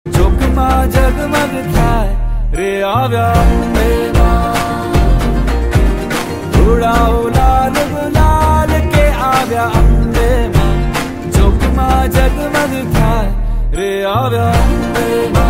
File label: Gujarati